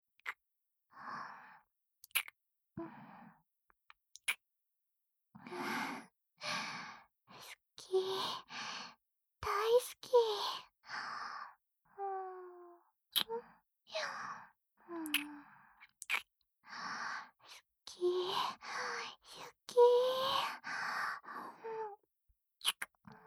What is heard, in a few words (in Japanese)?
くっさい甘キスループ; ASMR; ぐちゅぐちゅ耳舐めver